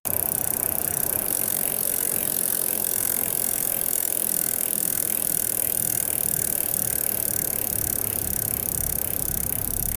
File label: speeding water bike